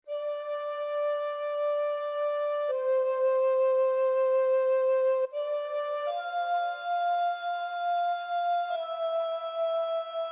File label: Flutes; laika aged through blood windows gamerip 2023